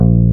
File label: BASS A#1